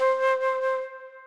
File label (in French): do4 flute